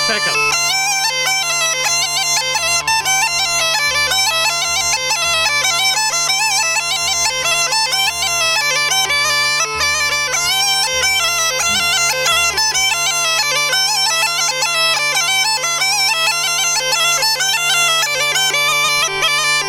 Nestinarsko Ruchenitsa Part 2, Small Gajda Lessons